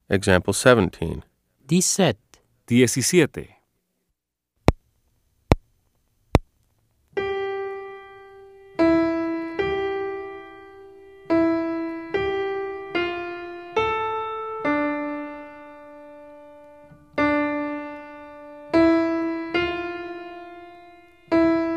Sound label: Keyboard